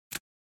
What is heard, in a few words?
Smartphone Lock Unlock, Dry